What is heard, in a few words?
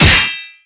Enemy Metal Damagehit; gamerip